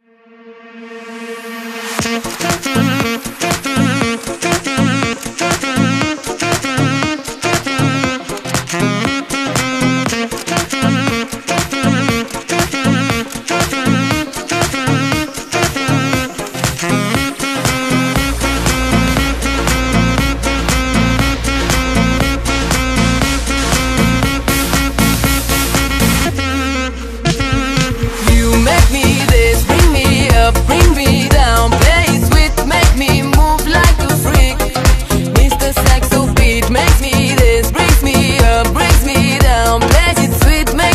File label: slowed